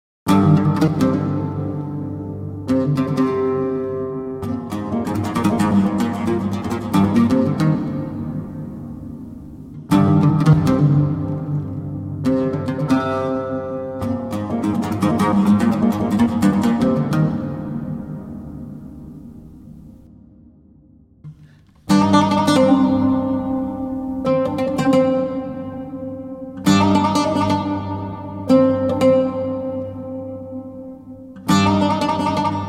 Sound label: Arabic Oud